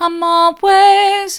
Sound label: i'm allways mid, vocal